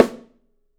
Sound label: Drums, MARCHING; 3D Drums; OP MARCHING